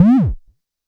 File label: KLONE WOO, Klone Dual-Percussion-Synthesiser; KLONE PERC NW8